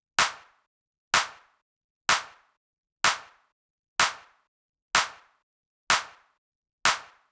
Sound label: Tech House Kit 126bpm Clap; EDM Drums; Drum Kits; Stems; Ghosthack; Ultimate EDM Drums; Tech House Kit 17